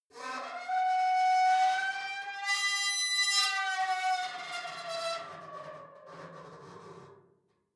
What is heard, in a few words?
metal squeal saturated intense; industrie-wirtschaft